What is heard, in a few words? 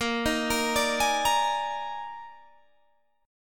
D sharp Major 7th Suspended 4th, A sharp